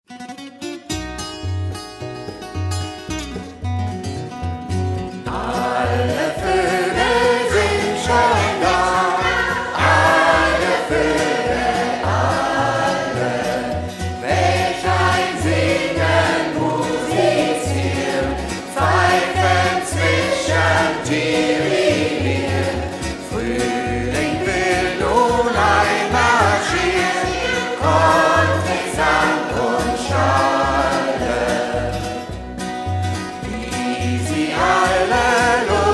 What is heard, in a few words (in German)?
Alle Voegel sind schon da